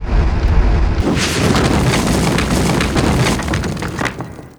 floor collapse; Hellboy

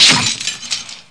bulb, impact small

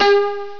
Open Gopher; Shareware World; NetPresenz 4.1

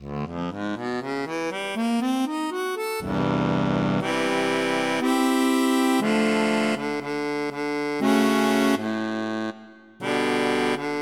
Harmonica Dry

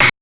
SNARE, Drum Bass, 160; eJay

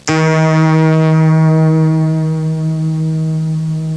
string twang, cartoon

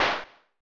Gunshot

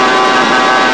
engine; WRECKAGE

